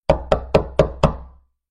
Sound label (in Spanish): tocar puerta